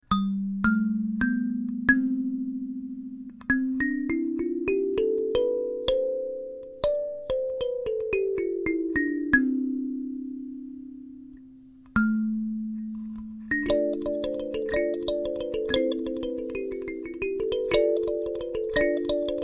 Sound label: 12 Note Example, kalimba